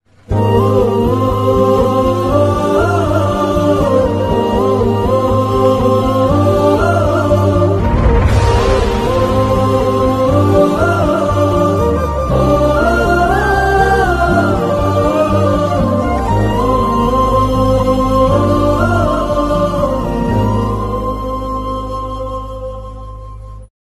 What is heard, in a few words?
tamil ringtone; caron tunes